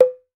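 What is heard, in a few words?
CLAY BONGO, African Perc; E-MU Formula 4000 Series Vol. 4; Earth Tones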